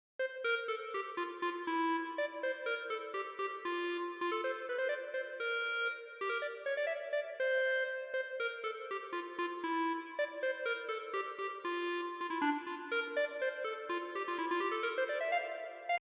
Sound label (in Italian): clarino